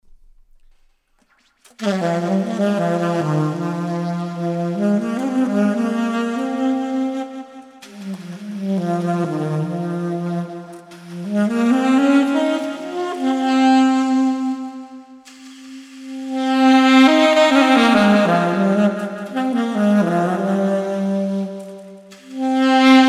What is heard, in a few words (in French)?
Marche; mélodie de Baud